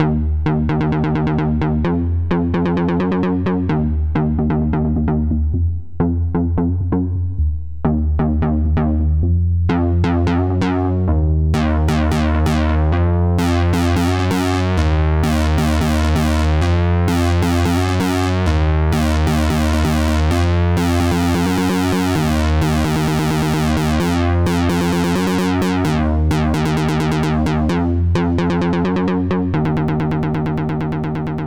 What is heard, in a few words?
bass loop